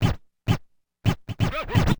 scratch, DJ Booth; effects

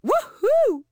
Woman Woohoo; Zomboid; Lifestyle

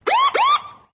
siren, DJ Booth effects

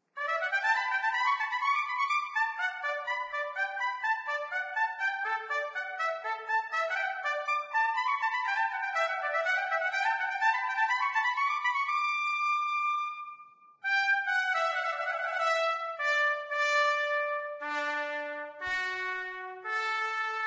Trumpet; Zomboid; Lifestyle

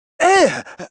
Man Fall; voice; Zomboid; Lifestyle

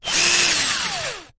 cordless drill swing; Zomboid; The Workshop

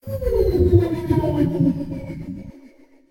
synth, DJ Booth effects

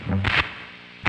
guitar stop; Instruments; Zomboid; Lifestyle

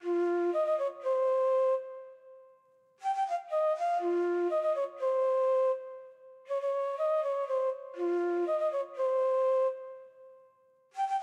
flute; Zomboid; EntertainYourself

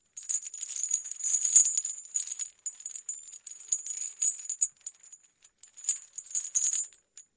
chain; Zomboid; tsarslib

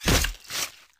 Blade Impale; Scrap Weapons; Zomboid

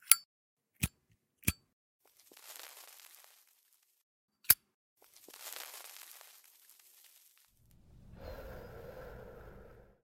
smoking lighter; Zomboid; Smoking Sound Overhaul

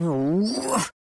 Man Frustrated; voice; Zomboid; Lifestyle

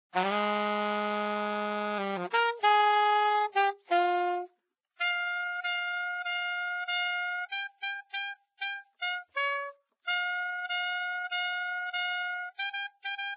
Saxophone; Zomboid; Lifestyle

